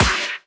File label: Snare; Vengeance Samples